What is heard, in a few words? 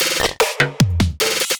Perc